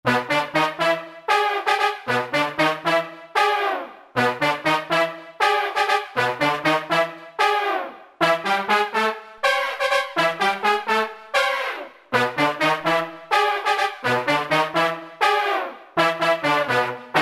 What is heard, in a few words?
Disco Stabs; Roland SRX-05 Supreme Dance